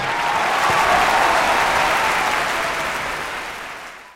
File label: baccarat cheer